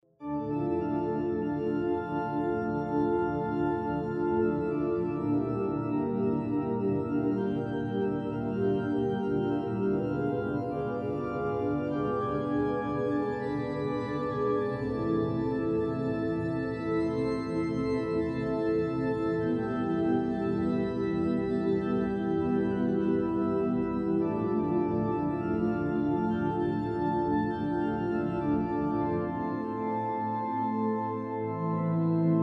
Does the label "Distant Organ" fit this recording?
Yes